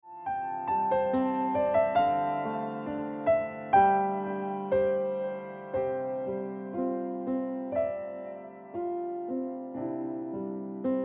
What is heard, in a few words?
Ringtone Piano Music